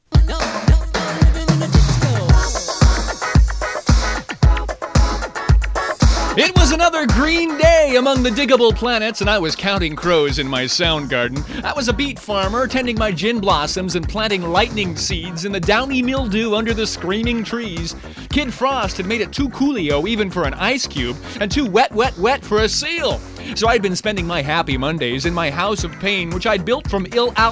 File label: Rock Rap